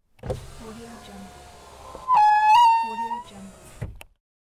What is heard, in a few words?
open squeaky electric car window; cinemandre